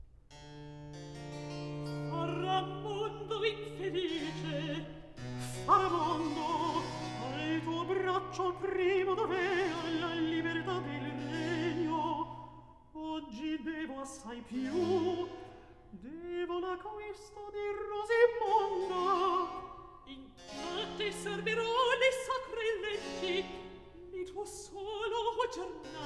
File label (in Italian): Recitativo; Faramondo infelice; Handel Masterworks; Act I